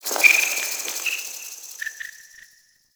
Interior Cave Critter; Warframe; Duviri; Ambience

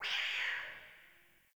Distant Cat Screech

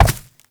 Step Vegetation, Movement; Uriel; Warframe

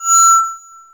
Mall Stage Feedback; Hub; Warframe; Ambience; Nineteen Ninety Nine

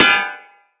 Melee Hammer Impact Generic; Weapons; Impacts; Hammers

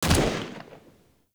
Fire Shot, Weapons; Warframe; Braton

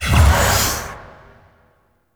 Hand Compass Cast; Warframe; Duviri

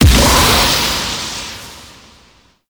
Sentient Dactolyst Revive Start; Warframe; Tau; Hard Mode

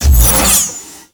Sword Whoosh; Warframe; Cephalon Weave; Weave Boss

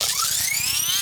Micro Zap Charge; Warframe; Corpus; Arachnoid Micro; Abilities

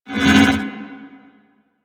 Void Portal Rotate One Off

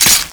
Adhesive Blast Latch; Warframe; Mods; Weapon Mods

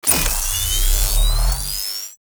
Modular Primary Barrel Charge, Weapons; Warframe; Modular Guns; Barrels

